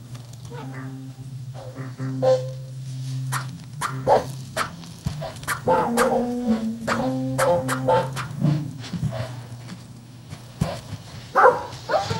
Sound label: light playful acoustic